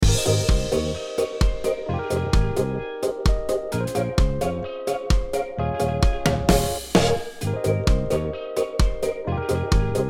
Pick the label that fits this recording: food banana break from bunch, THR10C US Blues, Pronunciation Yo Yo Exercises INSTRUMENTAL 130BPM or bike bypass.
Pronunciation Yo Yo Exercises INSTRUMENTAL 130BPM